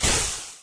cooldown; zrush

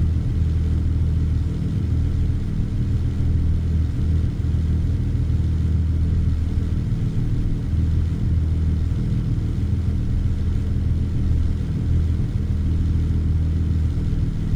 idle, vehicles cars; tvr cerbera; lwcars

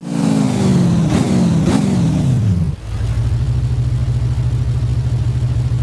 throttle off slow, vehicles cars; hummer h1; lwcars

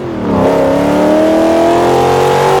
third, vehicles cars; lwcars; charger 2015

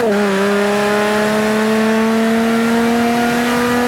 third, vehicles cars; lwcars

